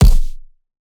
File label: Punching Percussion, Straight Hand, processed